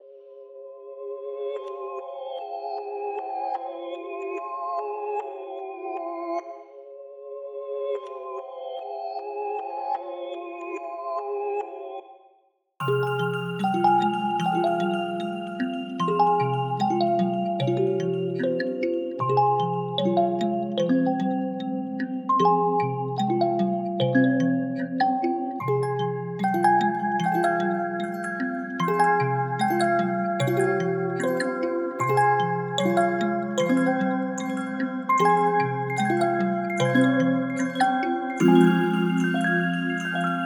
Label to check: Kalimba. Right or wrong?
Right